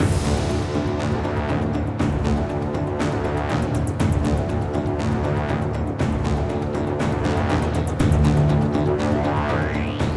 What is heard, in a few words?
music upcoming action heavy; freelancer-hd-edition